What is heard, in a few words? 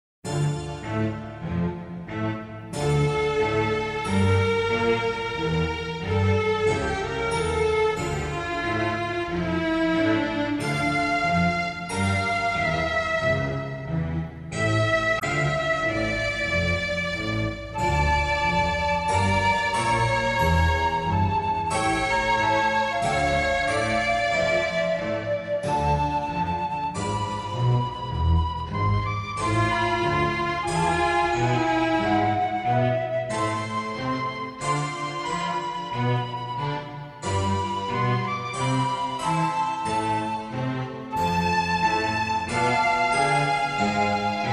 Easy Listening & Classical; Sound Ideas